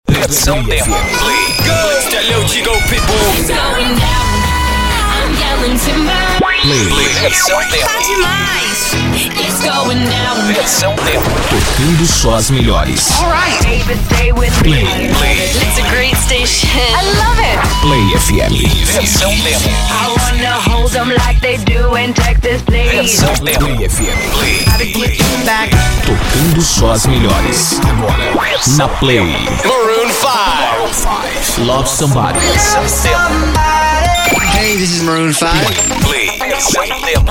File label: intro music